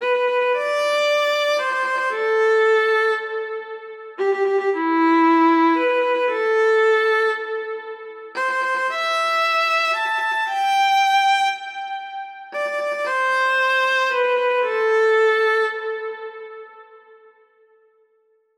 Solo Violin Shake

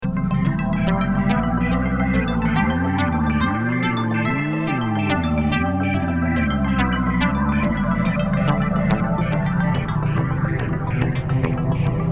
MIDI Test; Notion 3; Reason 5

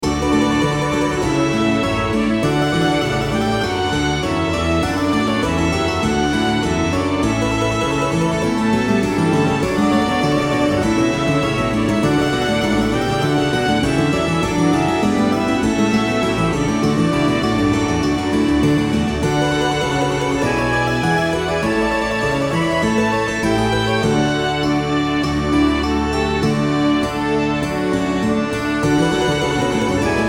TROMPETA VOLUNTARIO Ornamented Harpsichord; Surfwhammy

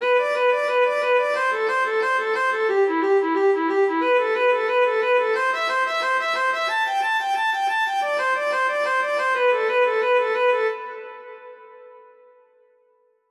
Solo Violin Explicit Shakes 180 BPM

